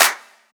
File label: Clap; 7rystan's 600+ FREE EDM SAMPLES & DRUMS; Percussion